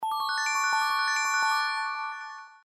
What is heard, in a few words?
big win; ThreeHeadedDragon